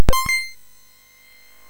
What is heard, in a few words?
sfx, MOS 6581; hvsc